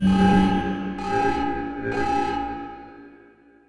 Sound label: alarm